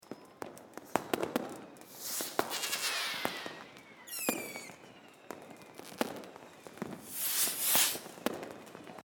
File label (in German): Silvester Atmosphaere